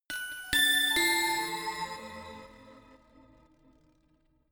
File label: notification glockenspiel crazy triad up diminished; instrumente